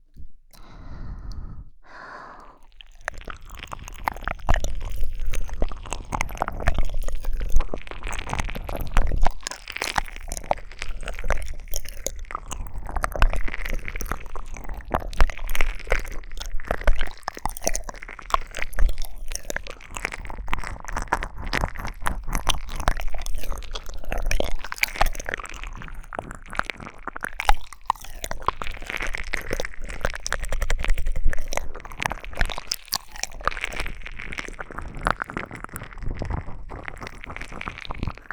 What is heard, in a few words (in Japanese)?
スクイーズ耳舐めコース